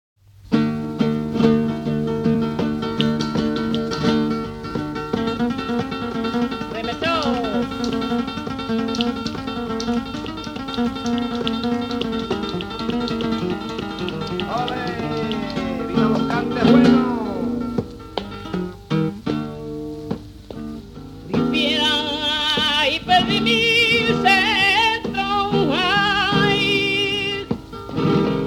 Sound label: flamenco; 1951年から62年までに録音された音源を収録したフラメンコ・アンソロジー